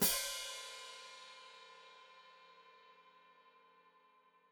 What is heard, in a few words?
Hat Closed Open, Hi-Hat